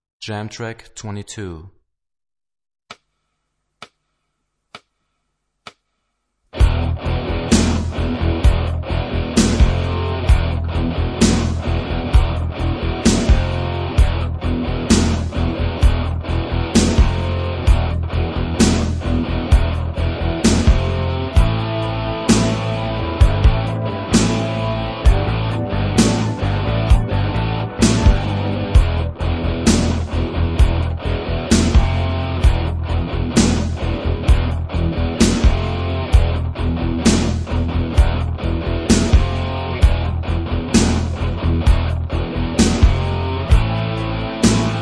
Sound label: Rock guitar; Rock gt Secrets